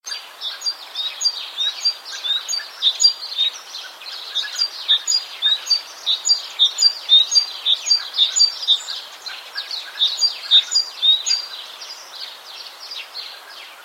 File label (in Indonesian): suara nyanyian